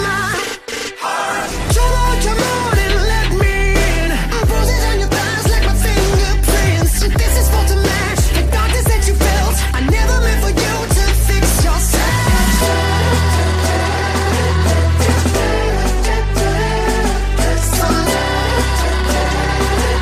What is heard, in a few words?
trap